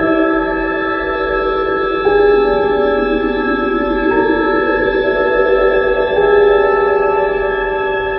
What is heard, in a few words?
strings, sad